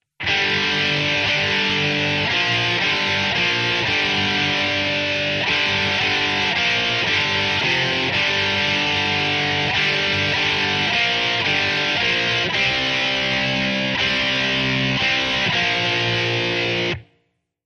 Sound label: Rock guitar; yao gun ji ta